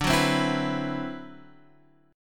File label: D 13th D, down, Guitar, Drop D